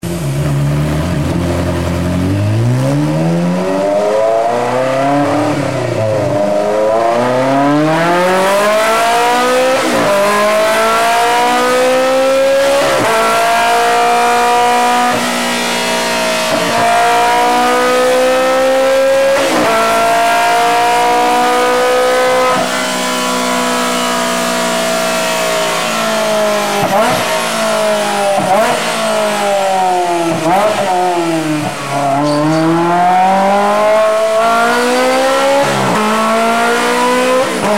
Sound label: Ferrari 360 Challenge Car